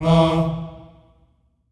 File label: Staccato F E3 R, Symphony of Voices; Spectrasonics